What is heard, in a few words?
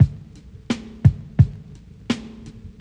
the blues beat